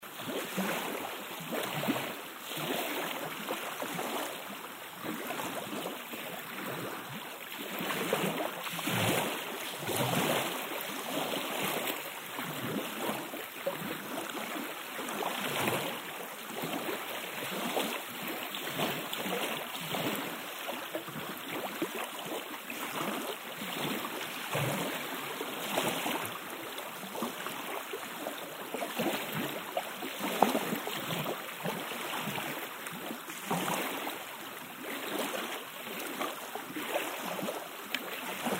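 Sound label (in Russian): volny pleshutsya, waves splashing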